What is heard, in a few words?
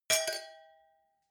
wine glass different clink bounce dull medium, gastronomie; berufe; soundpool